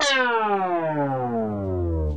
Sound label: dancefloor, Zaps and Beeps